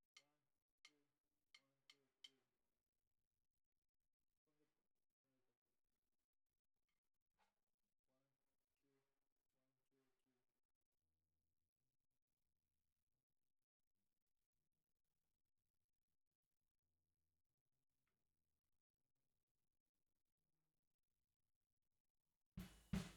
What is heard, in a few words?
SNR Bot